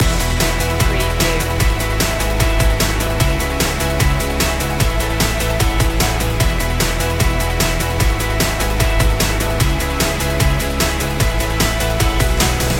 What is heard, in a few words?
sparks of light loop